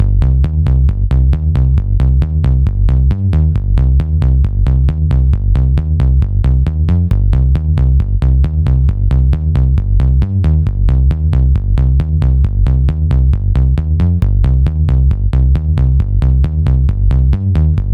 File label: UA Hitsville EQ Bass Pur